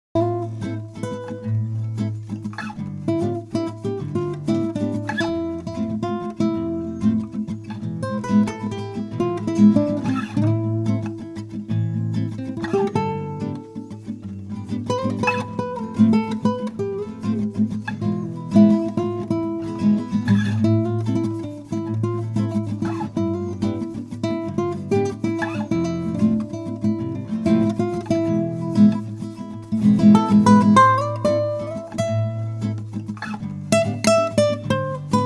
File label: Flamenco